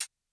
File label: button Up; netent